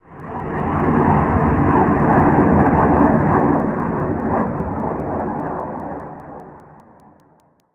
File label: a-10; mcheli